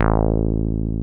303 BASIC A2; Drums In Your FACE!

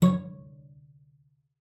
CELLO FINGER C#5; 90 s Sample CDs; Xsample VOL-5

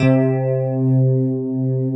FM ELP 4 C2 R, Keyboards Old School; USB Soundscan vol.09 - Keyboards Old School; AKAI

